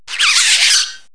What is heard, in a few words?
frog; skunny